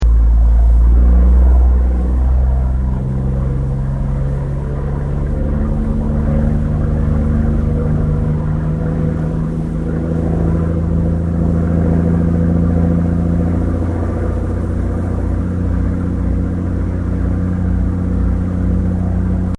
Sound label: BOAT TOURIST FERRY ACCELLERATING SLOW TO VERY FAST; Vehicles; Boats